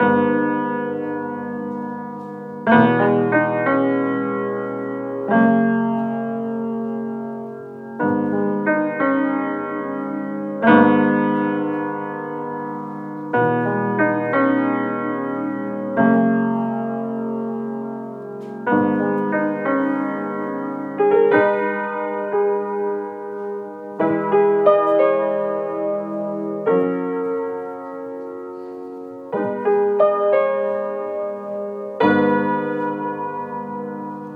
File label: 90 BPM G# Min, Piano; Trees; Cymatics; Bloom Piano Compositions